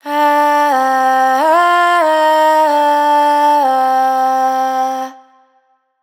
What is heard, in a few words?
riff 90 Cm wet, vocal; Slate Digital Omnivox Vocal Sample Pack